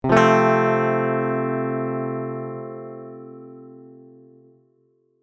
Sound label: Electric guitar F major single hard strum